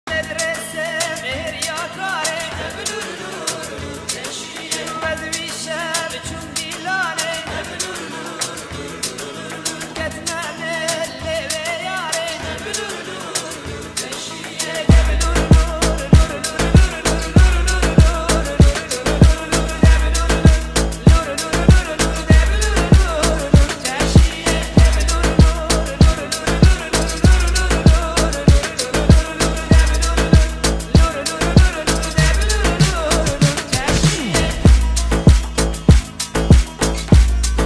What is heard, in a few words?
Kurdish trap; kurdishmusic